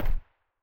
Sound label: sa58 silencer mono far